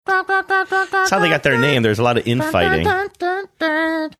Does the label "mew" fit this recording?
No